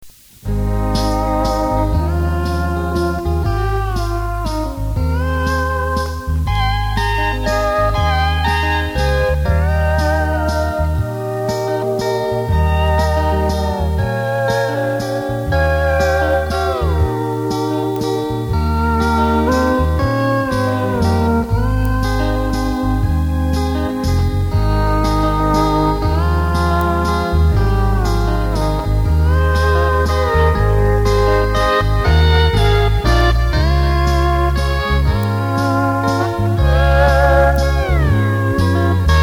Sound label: Steel Guitar, Waltz